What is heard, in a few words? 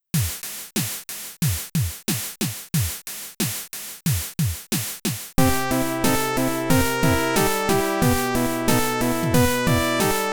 Internal Percussion